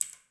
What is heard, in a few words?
keypress; sxmo utils